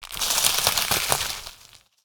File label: Foliage Attack Underlay